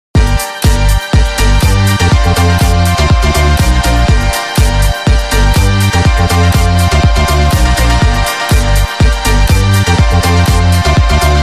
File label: HOUSE; IMMENSE DIABLO HOUSE